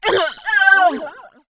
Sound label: harpy Die